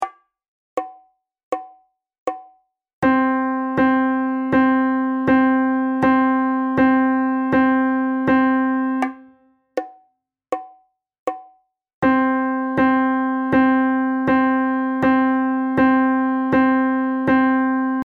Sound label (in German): keyboard viertelnoten hoerbeispiel